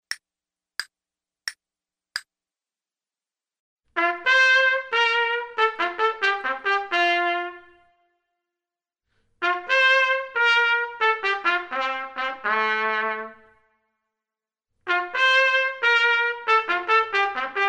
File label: trumpet; Selected Duets for Trumpet